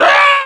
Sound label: lava; lmctf